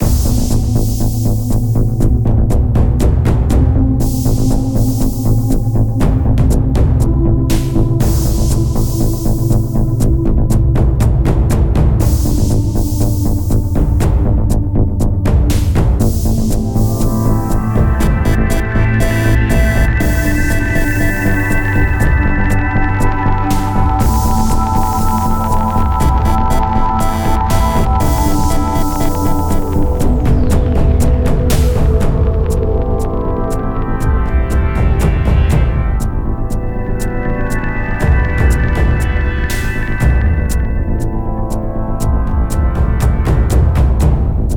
Tense

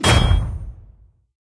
Hero Trident hit; Junpai

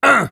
Voices Pain

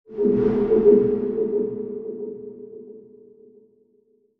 beak plant open

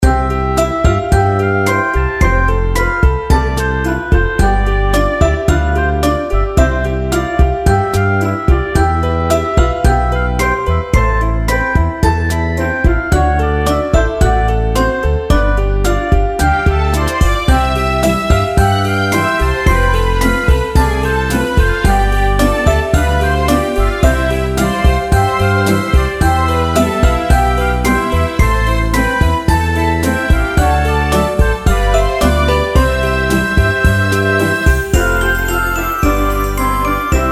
Interior Healing; Nexomon

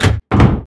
Unhauling

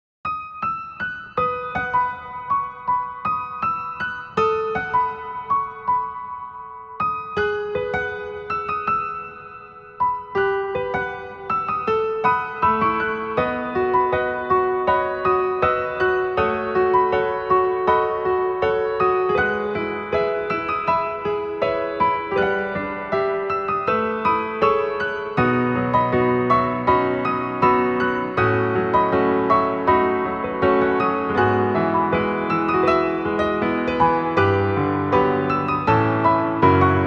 Piano Arrange

